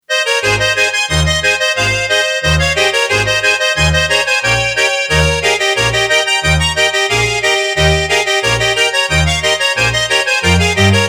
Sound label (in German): am Bass Ab